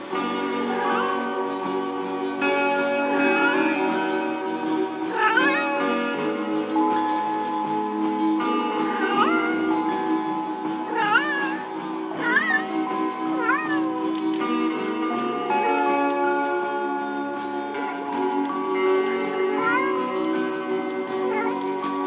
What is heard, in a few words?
Gigi Singing, cat rescue